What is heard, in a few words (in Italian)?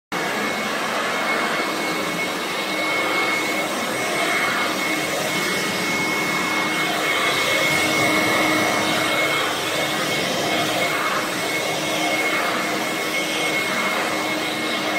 ASPIRAPOLVERE; riconosci l'oggetto dal suono